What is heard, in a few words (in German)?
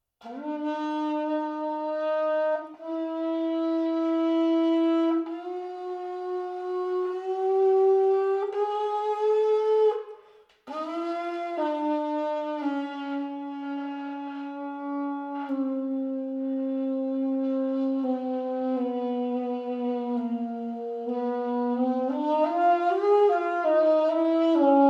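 sop, Alla tromba, Einzelne Töne gehalten; legato mit verschiedenen Tönen. Sopran; saxophon; spieltechniken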